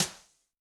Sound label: Snare, Yamaha DTXpress-II